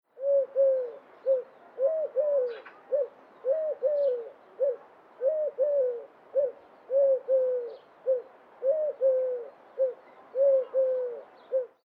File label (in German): Tuerkentaube